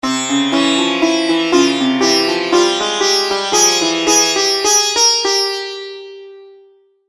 jingle; maoudamashii

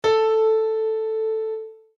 instruments piano ra; maoudamashii

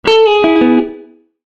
instruments guitar; maoudamashii

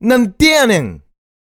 voice human; maoudamashii

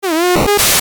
retro; maoudamashii

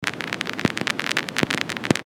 radio; maoudamashii

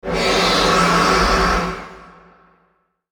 element darkness; maoudamashii

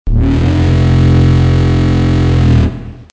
elevator; maoudamashii

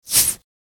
element wind; maoudamashii

